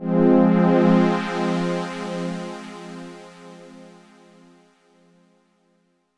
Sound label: F minor, Chords; Electronisounds